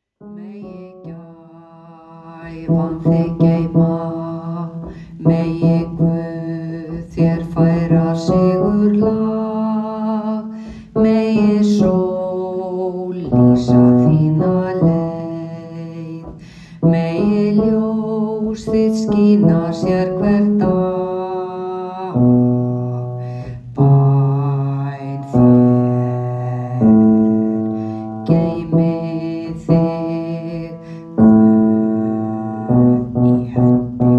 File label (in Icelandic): Megi gaefan thig geyma bassi